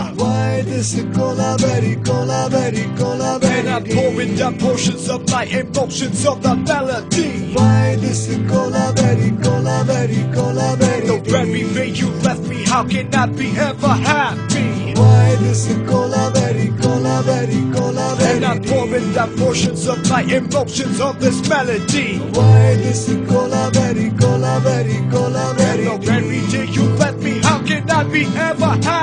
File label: Cool Rap